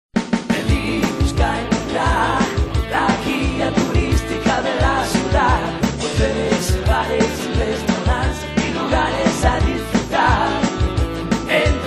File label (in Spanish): jingle; guia bilingue neuquen